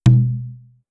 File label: Conga Hi Mute, Clavia DDrum AT, Pitched Down